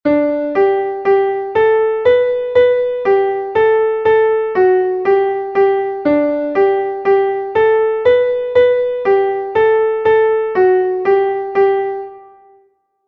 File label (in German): klavier; MelodieMeister